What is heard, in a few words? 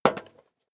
step leather shoes metal dry single right; schritte